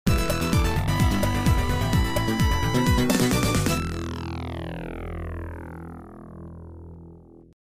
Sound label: stage clear; streets of rage 1